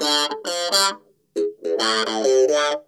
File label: T-TIME, Funk Guitar; 90 s Sample CDs; Zero G